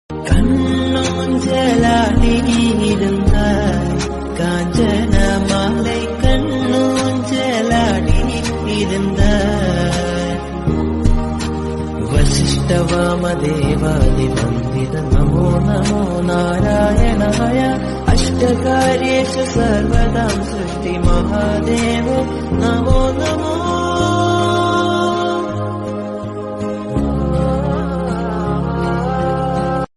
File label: marriage song Tamil song